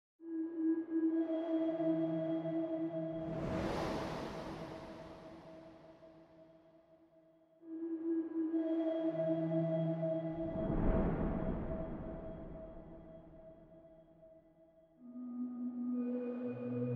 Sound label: The Sea Soundscape